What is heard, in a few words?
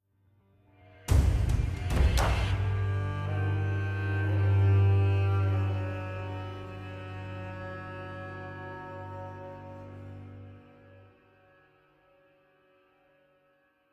String; assassin s creed unity gamerip 2014